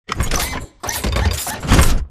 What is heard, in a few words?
dash backward; Titanfall 2